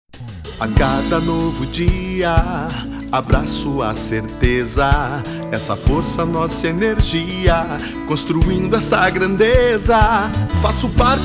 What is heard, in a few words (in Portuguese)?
Jingle; Certaja Energia